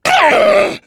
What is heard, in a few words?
pain; Left 4 Dead 2; jockey